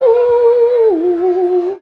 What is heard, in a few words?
"ooh woo" sung; ooh ahh